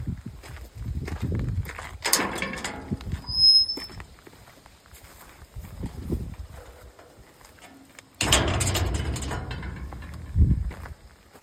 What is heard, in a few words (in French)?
nouveau portail entree, BRUITS DE PORTAILS; LUSSAIS 79